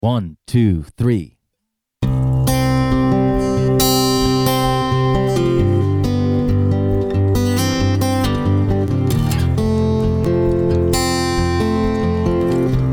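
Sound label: chorus guitar backing track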